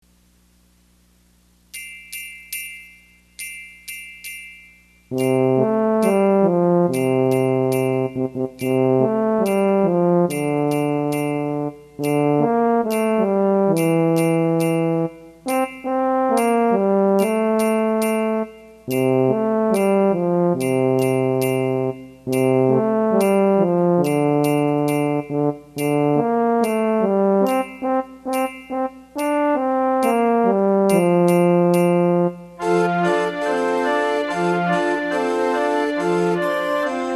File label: Jingle Bells, music